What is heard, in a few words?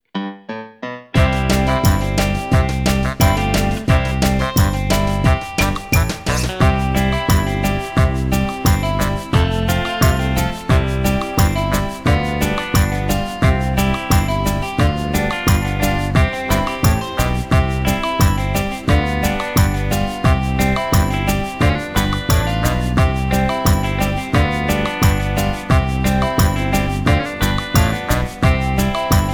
harmonica; blues harmonica; All the birds are already here